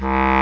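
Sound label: C2 BASS CLARINET; ORCH.COMP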